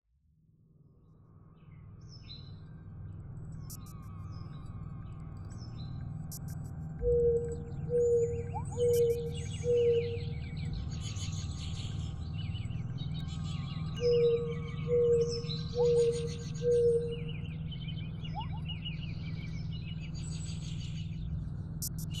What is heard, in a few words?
Birds, Wind, Synth